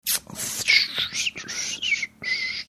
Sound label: thinking mouth sounds